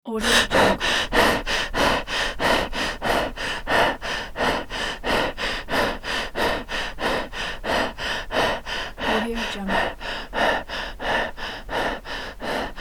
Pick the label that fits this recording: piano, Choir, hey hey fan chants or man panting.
man panting